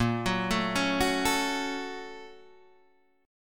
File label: A sharp Minor Major 7th Sharp 5th, down, Guitar, Open D